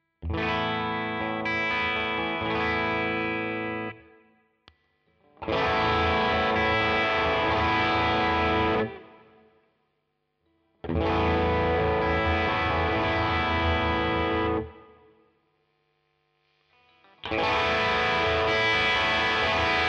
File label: Strat Tonesettings